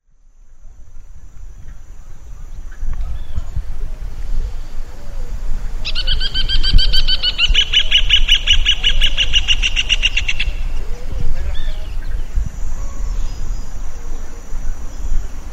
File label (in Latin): furnarius rufus; socorro